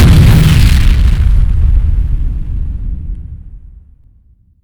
explosion Far

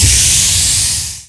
Combo Activated

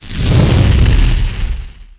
Weapon Flame Gun; SuperOrxoBros HS18

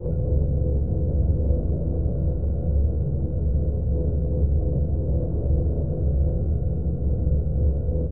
Engine low

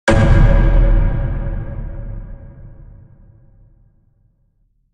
mine sound; SuperOrxoBros HS18

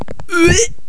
uae; voices

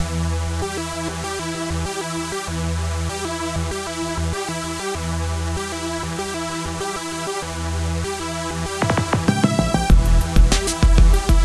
race theme; SuperOrxoBros HS18

